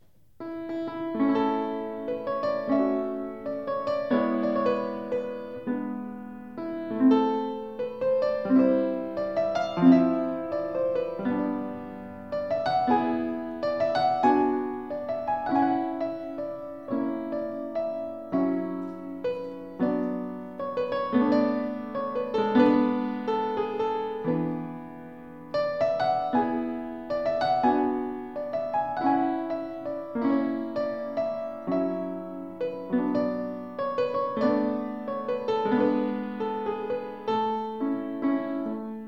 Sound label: theme piano complete